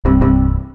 pochti polnaya razryadka batarei sistemnij zvuk windows xp

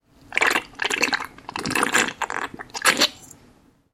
chavkat

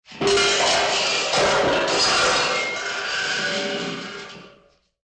padenie metallicheskih predmetov

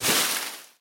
shum ot sbora suhih listev

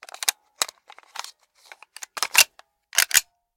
netoroplivaya medlennaya perezaryadka pistoleta

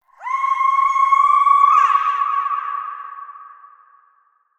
malenkij prizrak rev uzhasov

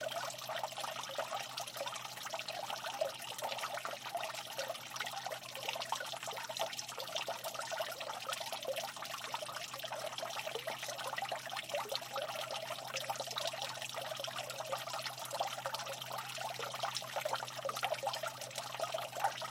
zalivka vodi fontana

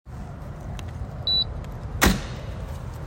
eto dver kotoruyu otpirayut. zvukovoj signal oznachaet chto klyuch karta skaniruetsya a za

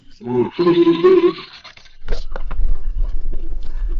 golos gippopotamov

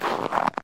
skripuchij shag po utrennemu moroznomu snegu

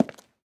tyazhyolij chyotkij zvuk kablukov po polu

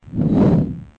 zvonit veter i letit skvoz migayushij zvuk

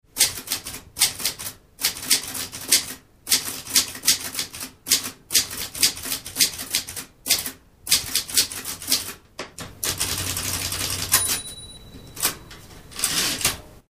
pechatnaya mashinka

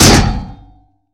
korotkij metallicheskij udar

